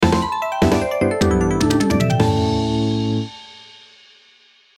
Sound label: Short mistery